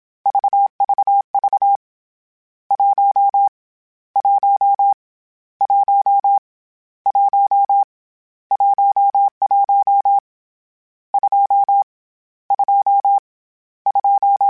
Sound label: Morse Code, CW Code Groups; Mixed